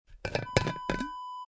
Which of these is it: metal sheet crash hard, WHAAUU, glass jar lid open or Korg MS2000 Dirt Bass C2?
glass jar lid open